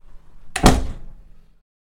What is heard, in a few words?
Door Close